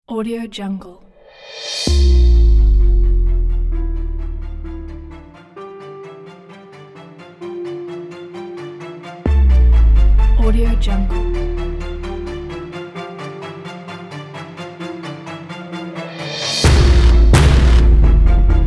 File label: hybrid trailer